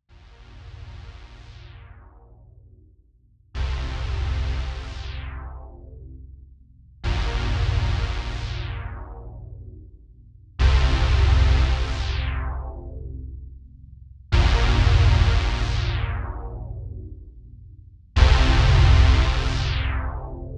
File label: Poly Syn